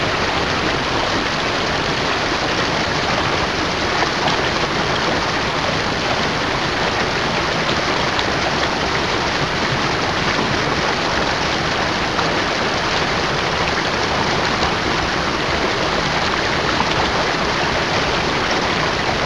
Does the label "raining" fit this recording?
Yes